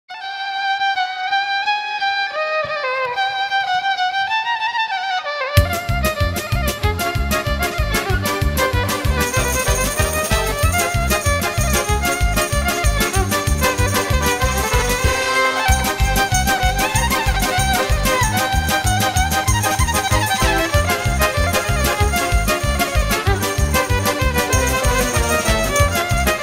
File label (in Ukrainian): коломийка